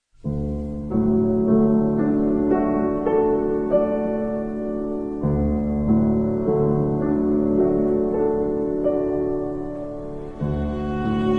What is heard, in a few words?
cello, piano; Jules Massenet; Meditation